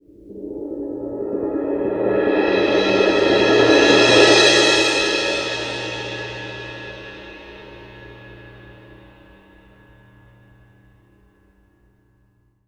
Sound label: CYM CRS L, Synclavier Percussion; ILIO; Synclavier Percussion 2; World & Orchestral